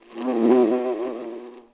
insect; DAoC

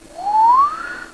whistle; Sound Effects for Multimedia - Volume 1; wind instruments

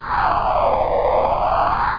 Wave End; Grid Warrior 1.2.6 Demo

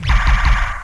fan fire

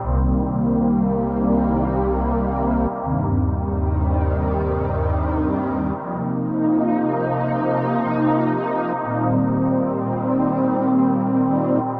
synth pad ambient 160 C; Sphere

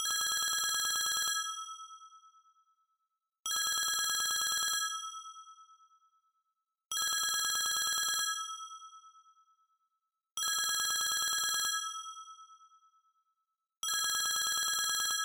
phone

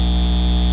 neon

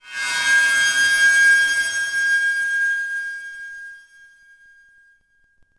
ice; DAoC

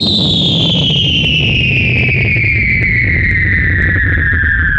Air Bomb Pitch; Red Shark

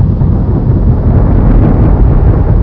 quake; Hexen2

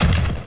bam1; PC Gamer IT CD 31; REALM

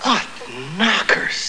Horror Critical Stop

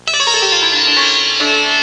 sitar; Sound Library 2000; Wayzata Technology

